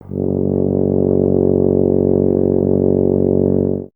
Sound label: BRS TUBA, Solo Instruments